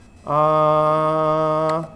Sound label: aah